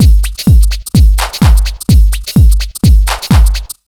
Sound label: 127 BEAT, Fresh Disco House; AKAI; USB Soundscan vol.20 - Fresh Disco House I